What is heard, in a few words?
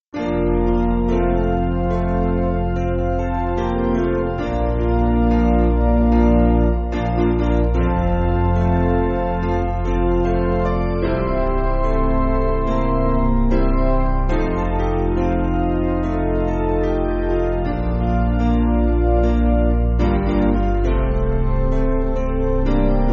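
Piano, church backing; Basic Piano & Organ (CM)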